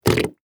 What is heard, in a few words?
mud fart bubble